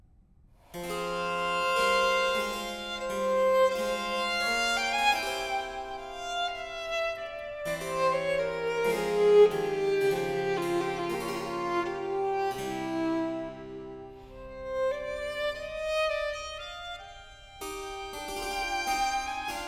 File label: Violin Sonata, Largo; Op.1 No.14; Handel